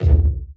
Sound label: 00s strong bottom end hip hop kick drum sample d key